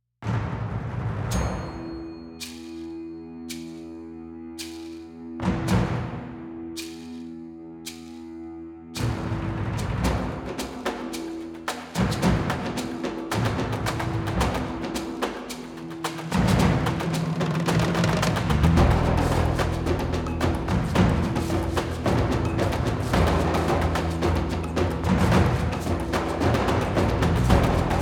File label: song of percussions, tension action